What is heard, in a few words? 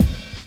SNARE C KI, SUPER FUNK; Pro-Rec - Synthsonic